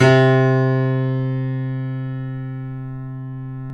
PIA GRND, Grand; Sound & Vision; Gigapack I CD 2; PIA GRAND 1

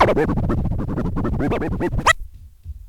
SCRATCH; E-MU Formula 4000 Series Vol. 1; Hip Hop Nation

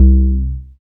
BAS FM DX-7

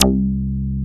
REZO BASS; Masterbits; Dance Trax

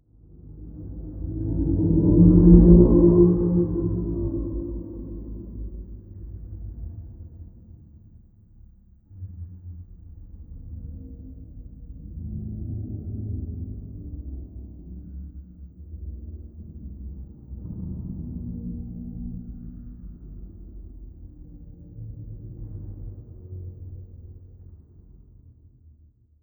M SHIP, Anime Sound FX, FLY OBJECTS

